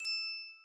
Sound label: count Up End; jack and beanstalk